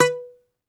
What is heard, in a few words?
Greek Bazouki, Plucked Strings